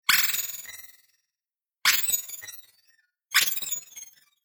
Future Telemetry Glassy Shimmer High Hits Interface Delayed Space; Sound Design; Tech